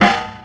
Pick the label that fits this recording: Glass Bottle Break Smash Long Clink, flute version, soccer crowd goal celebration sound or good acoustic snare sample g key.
good acoustic snare sample g key